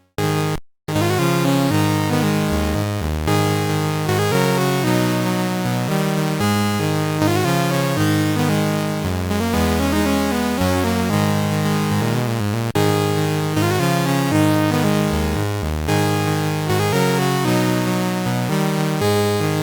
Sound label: Combat